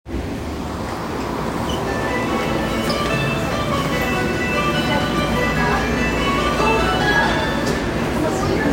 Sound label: train sounds; 南北線四ツ谷北